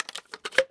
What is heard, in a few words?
launcher insert grenade; Civ, Weapons, Guns, Interact